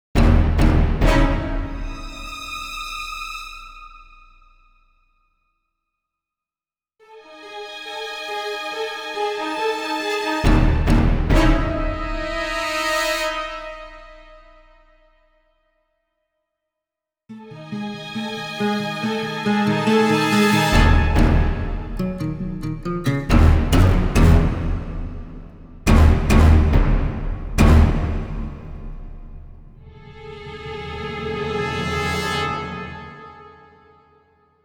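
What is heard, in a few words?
Dark Tension No Rhythm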